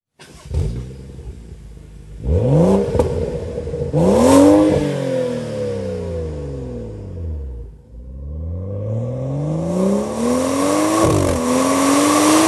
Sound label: Stock exhaust BMW 335i F30